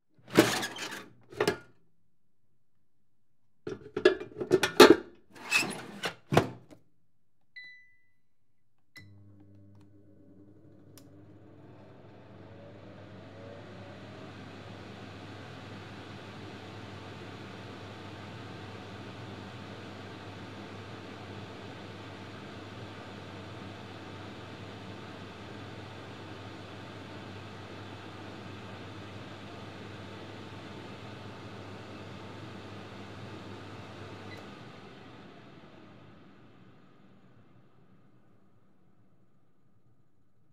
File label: air fryer